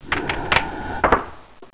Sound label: door move